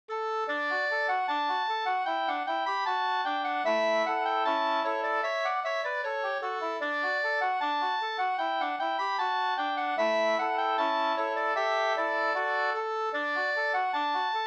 skanklat; Begavningsmarsch

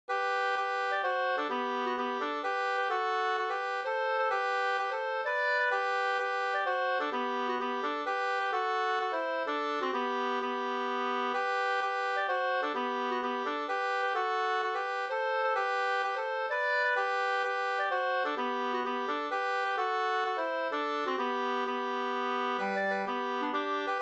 hamburska, 2st

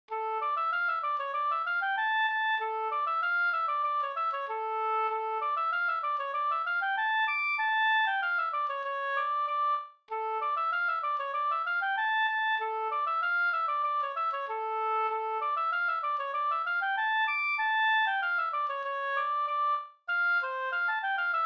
engelska; Burs

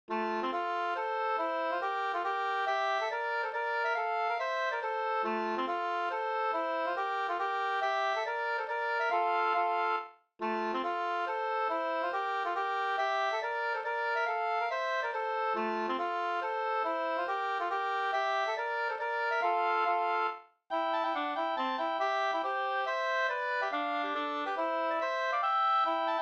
hambopolska

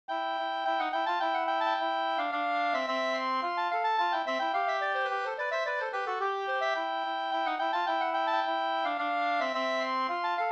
polska, Cdur, 2st; Byss-Kalle

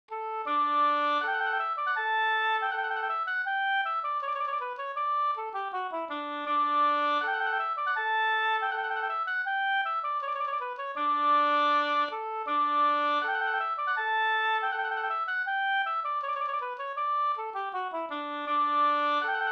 ganglat

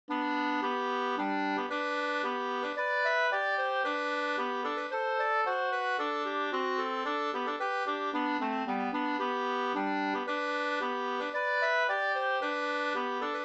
ganglat Gdur; Taxingemarschen Sd

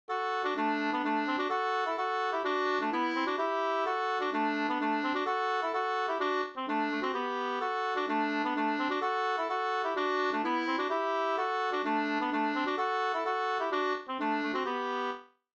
folkdans; Skinkompass e Ernst Abrahamsson Bh 2st

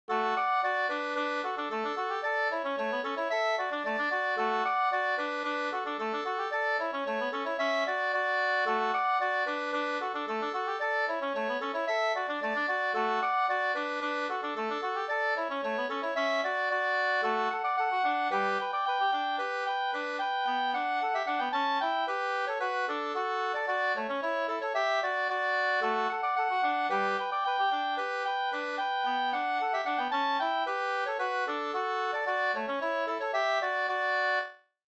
polka polkett; Oxmarschen Jarna Sd 2st

